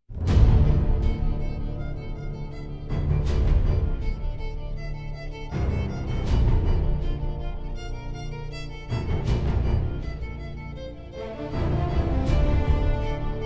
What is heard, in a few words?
Menu Pause; crysis 2; ps3; xbox 360